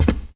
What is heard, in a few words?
Footsteps; SFX Character